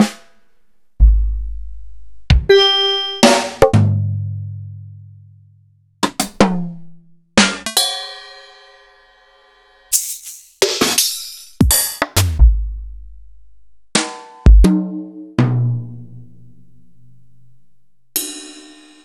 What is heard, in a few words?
EMU PX-7; m8